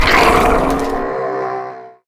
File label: squid attack; npc